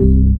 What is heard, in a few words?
ORG, DANCE ORGAN